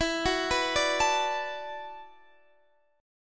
E 7th Suspended 2nd Suspended 4th, down, Guitar, DADGAD